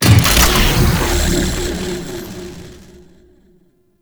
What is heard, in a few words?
Shrine Assemble Activation; Warframe; Duviri Murmur